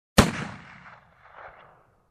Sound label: Musket shot